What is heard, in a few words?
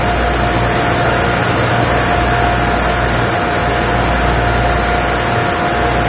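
ambience machine whine; dm subchamber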